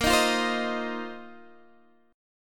Bb Suspended 2nd Suspended 4th Bb down Guitar Standard; strummed